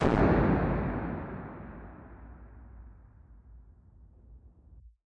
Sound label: smash boooooom; Punches Hits Discoblasts; single hits